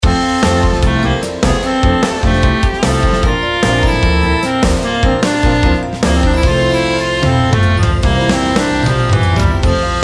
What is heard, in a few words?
happy jingle